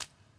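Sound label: wet walk left, footsteps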